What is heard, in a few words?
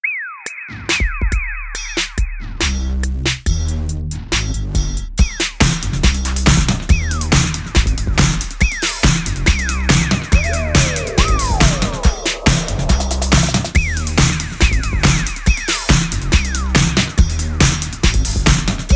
electro